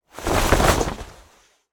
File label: Whoosh Cloth Leather Fight; Just Whoosh 3; soundbits